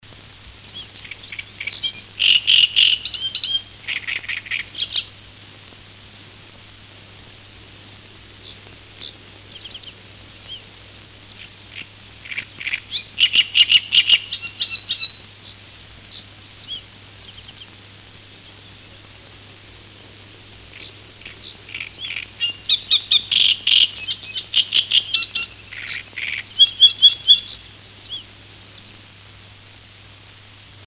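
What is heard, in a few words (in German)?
Drosselrohrsänger; Vogel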